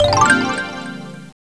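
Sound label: sound test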